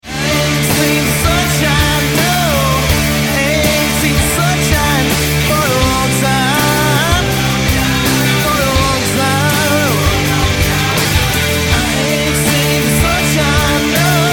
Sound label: ringtone